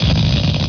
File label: shock; BESTSHOT; noisybit